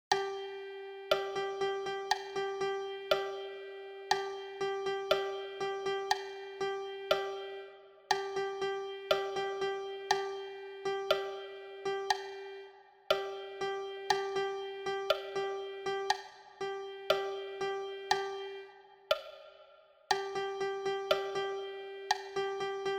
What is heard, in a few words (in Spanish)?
percusion